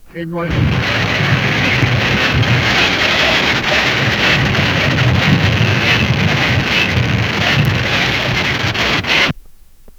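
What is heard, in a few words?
jeep tire stuck and screeching, offroad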